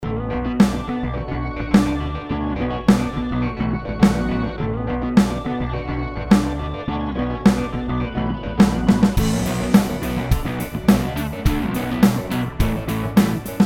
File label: Sample Song